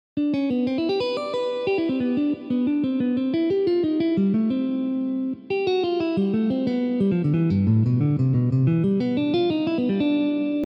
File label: C Major Phrase